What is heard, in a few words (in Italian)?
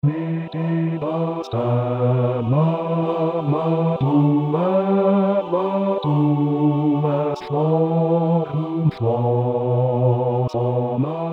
bassi